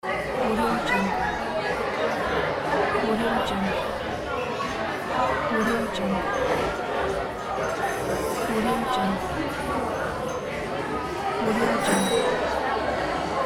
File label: restaurant